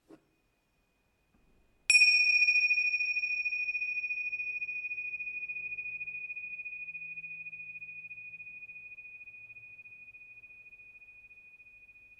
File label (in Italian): cimbali, lega dei 7 metalli